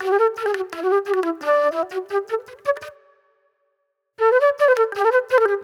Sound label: Wild Flute